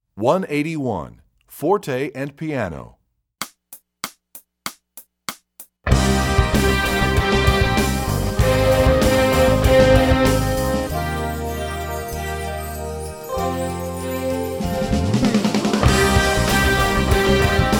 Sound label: Forte And Piano, Strings; EE2000 Book1